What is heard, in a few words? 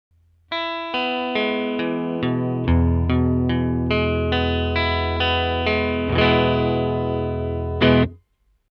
jag 2001 neck rhythm